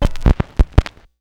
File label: Needle Up, Vinyl Record Samples; Needle Noises